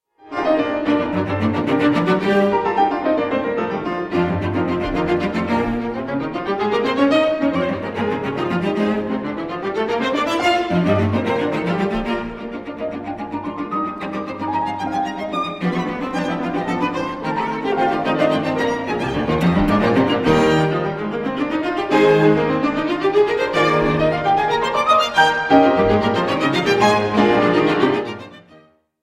quintet for piano and strings in E flat major 3rd movement; robert schumann; op 44